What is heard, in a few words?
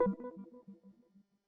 space idle